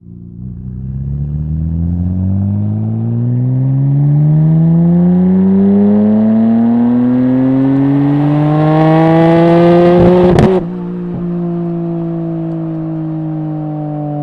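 nissan r33 skyline onboard ramps drive exhaust left; Sonniss GDC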